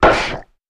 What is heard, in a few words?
Crocodile Jaw Snap; Animals-Wild